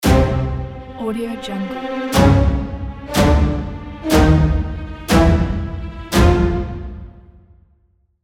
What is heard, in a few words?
cartoon tension crescendo